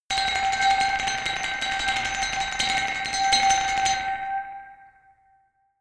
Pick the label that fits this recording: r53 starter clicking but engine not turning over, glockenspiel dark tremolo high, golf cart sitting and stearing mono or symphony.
glockenspiel dark tremolo high